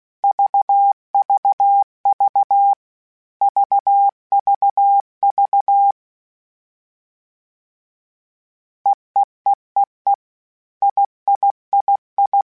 All Groups, Morse Code; CW Code Groups; Basic Letter Groups Collective7; HamRadio; Basic Sets

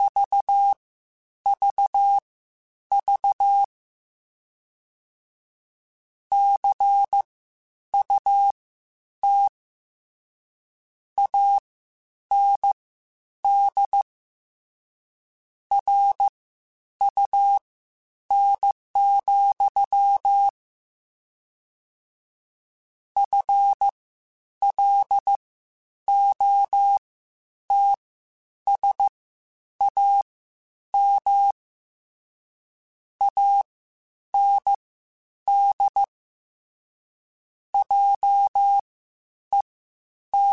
Word Pairs, Morse Code; HamRadio

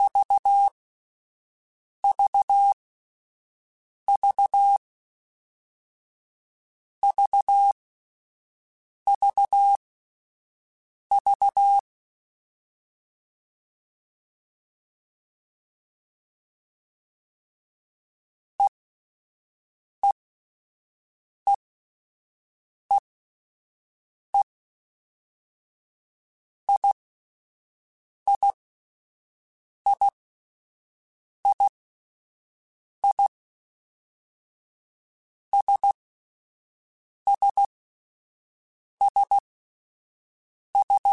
All Groups, Morse Code; HamRadio; CW Code Groups; Basic Sets

